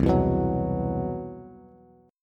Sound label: C Major Sharp 11th C down Bass Bass Standard; strummed; CM#11 chord